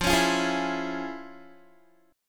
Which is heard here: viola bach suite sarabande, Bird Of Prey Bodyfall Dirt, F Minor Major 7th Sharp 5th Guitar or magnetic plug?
F Minor Major 7th Sharp 5th Guitar